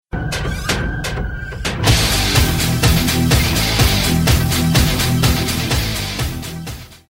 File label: tecnohard